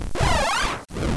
Bug Thing

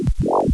Pulse; Zap

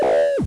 Bounce

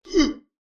weird

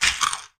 Eat

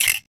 Bug

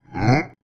Grunt

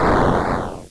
Growl

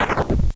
Crumble

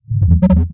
Faint

